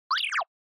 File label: Motion Slide Dry